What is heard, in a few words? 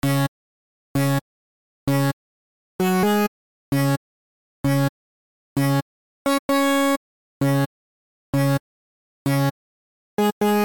Raw Saw; Porco